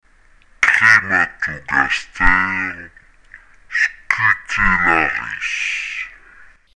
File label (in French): crematogaster, fourmi; phonologie